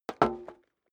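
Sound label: step dress boots metal dry single stair down, footsteps; schritte; menschen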